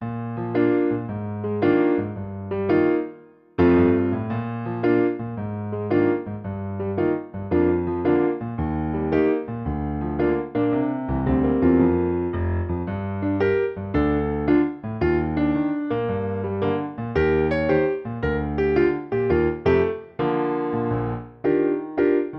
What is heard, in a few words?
piano